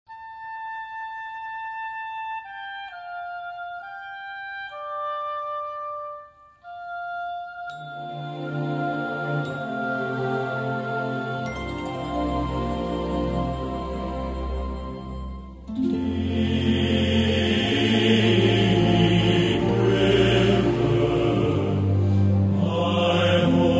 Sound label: Men's Choir; Deep River; Get On Board